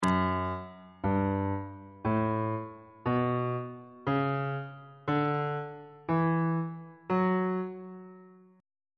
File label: f sharp phrygian mode on bass clef